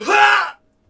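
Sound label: Swordsman Die Vox; Civ4 warlords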